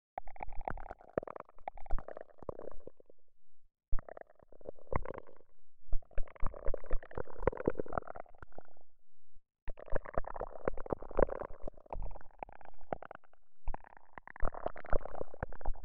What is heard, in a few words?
Crackling and popping sound of alien pods hatching; Aliens